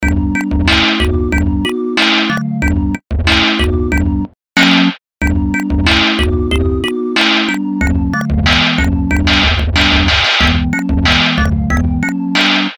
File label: sine wave doodle